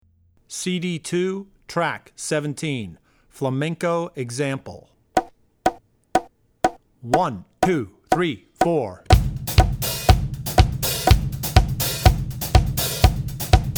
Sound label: Flamenco; Drummer's Bible Tracks